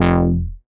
bass F1, EMS VCS3; Polyend; Simulacrum Sounds; 1 Shots; Synths

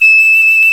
fife, British fife; Roland L-CDX-03 Disk 1

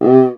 CUICA, PERCUSSION; Crypton - DrumBase5000

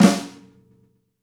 Rock Snare Fl H, Roland BK-5; Snares

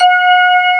HAM B5, HAMMONDS; East West; Global Sounds

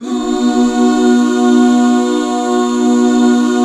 B MAJ, Back Chords; Sound & Vision - Gigapack II CD 1

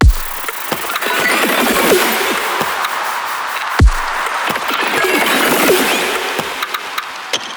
Dritiest fucking drum ever

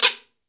GUIRO, Cuba; AKAI S6000 CD-ROM - Volume 5

